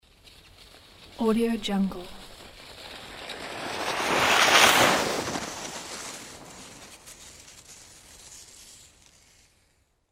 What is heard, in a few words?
sled pass by